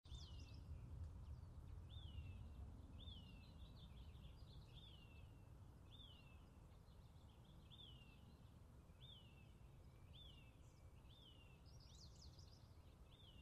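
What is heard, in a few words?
chirping of birds in yard in suburb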